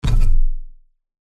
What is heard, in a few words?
multimedia game designed impact heavy thud